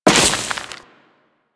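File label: Crewman Step Vegetation, Footsteps; Warframe; Corpus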